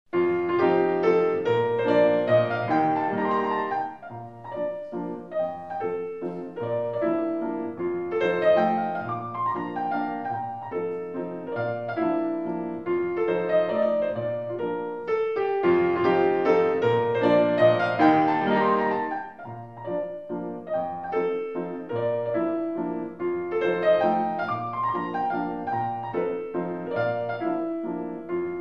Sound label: Piano Recital Mazurka live; Chopin